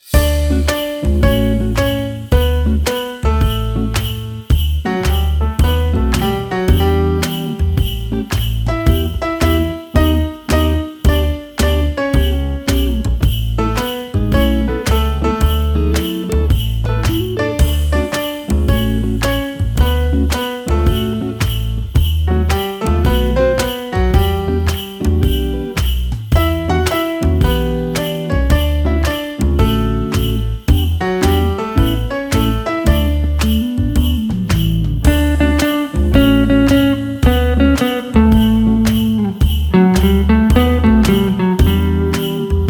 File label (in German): Weihnachtlich Jazz